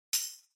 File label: Tambourine Headless Hit Hand